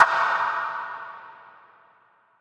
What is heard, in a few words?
Reverb Percussion